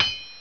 button click; wizardwars; UI

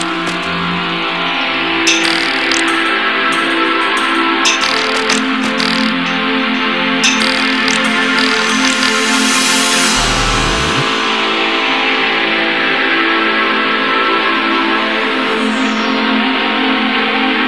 BG MUSIC; svencoop

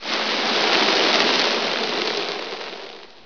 sandfall, ambience

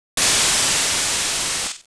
beam

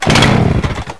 chainsaw start; weapons; svencoop

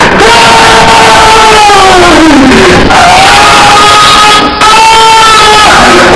mega scream; svencoop

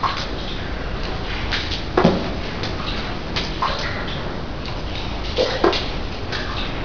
drips

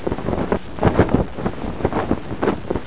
flag flap

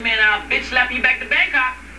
slap

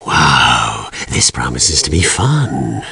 Cheer; svencoop; voice commands

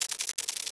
walk, roach; cstrike

